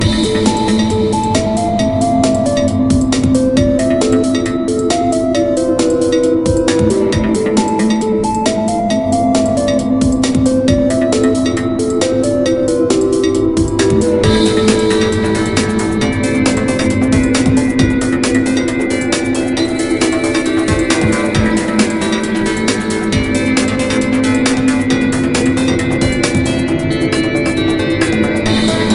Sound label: Dark Seaside Theme Loop; Boulder Attack 2